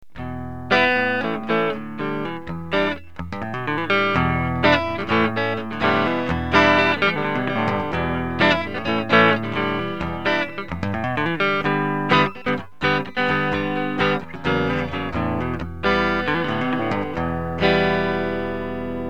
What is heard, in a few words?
neck clean, guitars